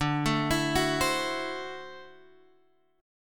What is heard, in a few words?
D 9th D down Guitar Open G